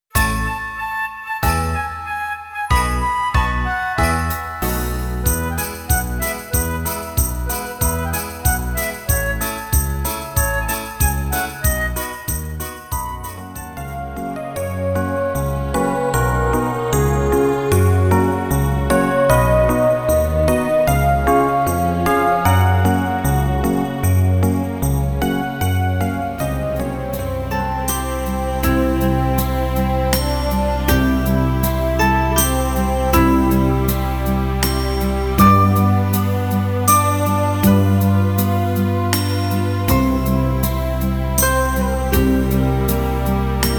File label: christmas, BACKGROUND MUSIC FOR VIDEOS; christmas demo